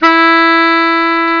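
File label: Bari High; Instrmnt; Sound Library 2000; Wayzata Technology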